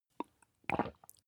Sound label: drink swallow hard